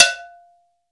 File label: C. BELL